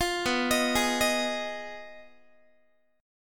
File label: B 6th Flat 5th B down Guitar 4A 4E 5A 5D 5G sharp 6C sharp; B6b5; arpeggiated